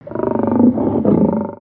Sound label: Pet Trike Idle; creatures pets